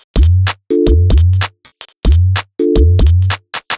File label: 127bpm, House Drumloops; Zero-G House Fabrik Drumloops; ZG Drumloops